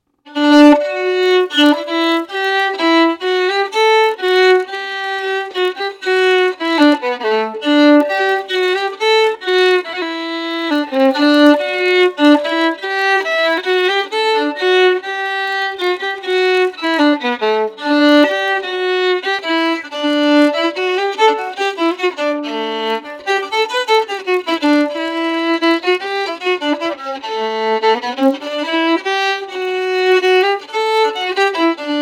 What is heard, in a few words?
Waltz